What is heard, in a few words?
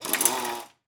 Air Ratchet Run